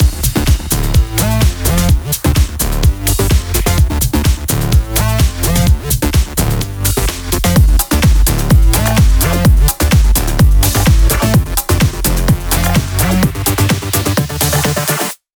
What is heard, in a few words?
127BPM The Mission Mix; Vengeance Samples; The Mission Kit